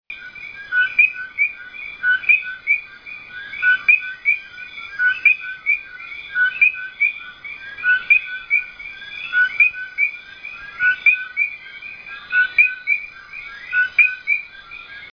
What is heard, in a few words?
eleutherodactylus portoricensis el yunque national forest puerto rico; white-eyed frog; softer and less far-carrying, with the second note being of less duration than the first and without a rising inflexion; West Indian